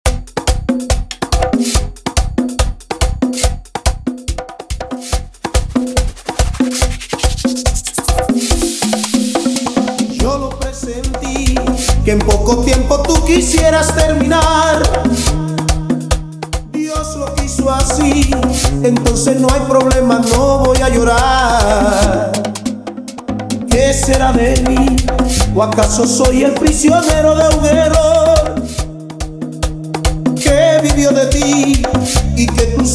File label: 142 BPM Acapella Intro